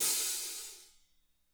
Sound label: hat, drum; 90s sample CD